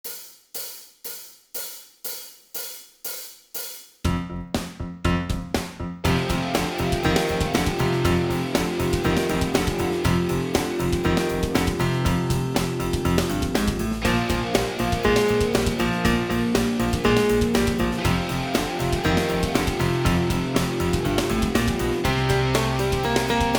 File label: Heavy Metal